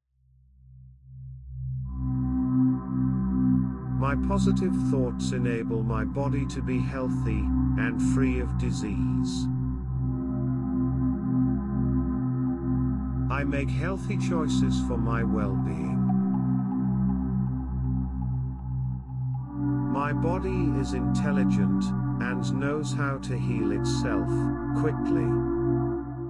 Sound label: Sample Delta In C Healthy